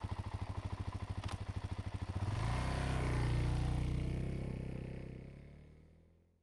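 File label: Suzuki DRZ400 exterior slow various; ortf; mkh8040; Sonniss GDC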